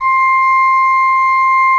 C5, Cathedral Organ, ROHRFLOETE; Propeller Island

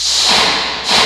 SCREAMIN; E-MU Formula 4000 Series Vol. 2

